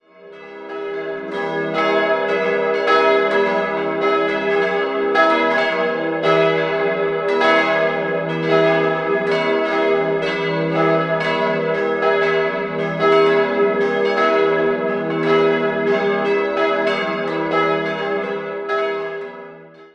Adelshausen, glocken

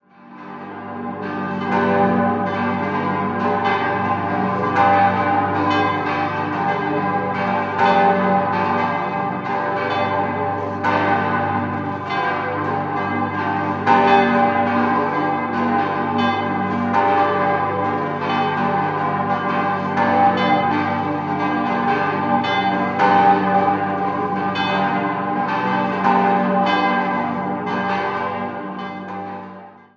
Bregenz Gallus, glocken

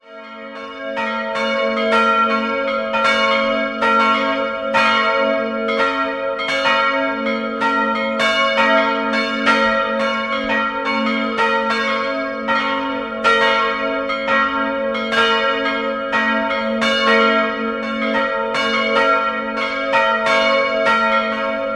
Ainau, Glocken, Pfarrkirche St. Ulrich; Jahr 2009 und die kleinste hängt bereits seit 1539 auf dem Turm. Sie ist ein Werk von Hans Graf aus Landshut.

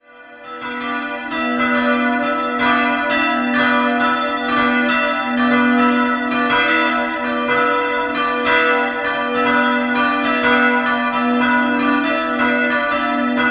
Wengen, Glocken

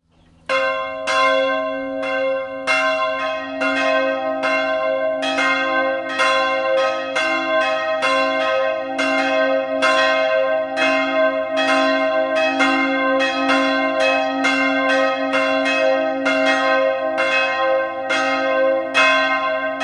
Winterzhofen, glocken

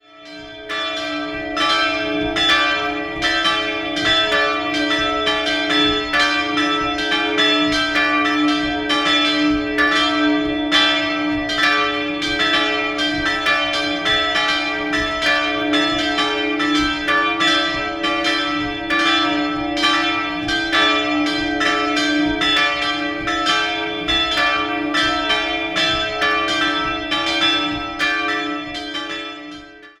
Hackenhofen, Glocken

